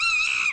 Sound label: monkey; sfx